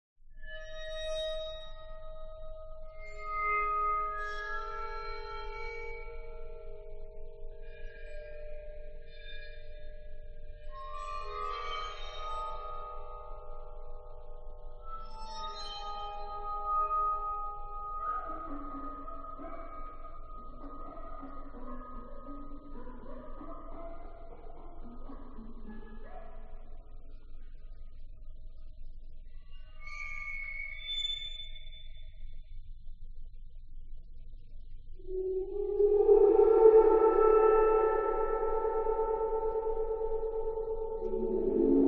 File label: Wild Percussion